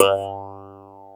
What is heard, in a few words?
throat, JD8 attack